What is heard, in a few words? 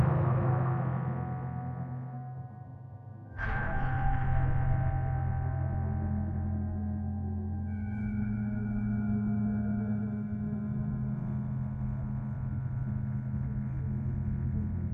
fire crackle; sound effects